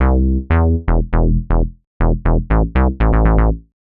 Bass Loop

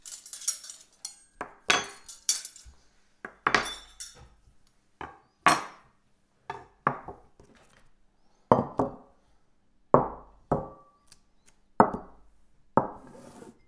Laying Table For Dish

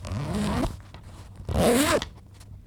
Zip

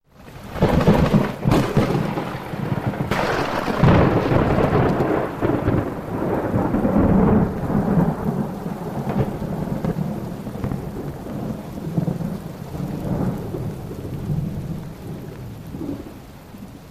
Thunder Strikes Lightning Storm Claps

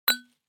Hitting Glass

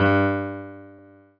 Piano Ff

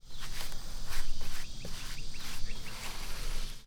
Paint Brush

